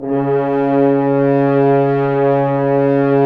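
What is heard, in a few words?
brass french horn f; Roland - LA Composer vol. 1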